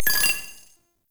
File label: Flying Robot Beep; Quests; Veilbreaker; Ambience; Kahl Quest